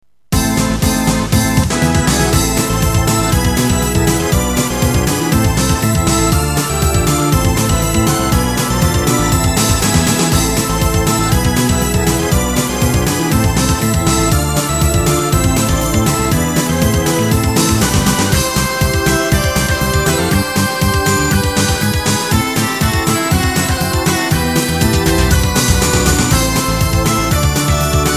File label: battle